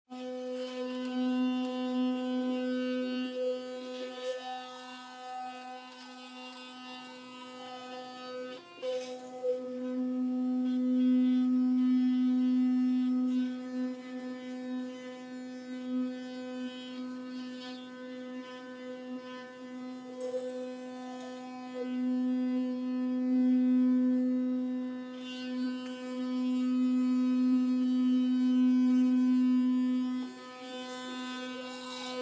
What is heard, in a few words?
electric toothbrush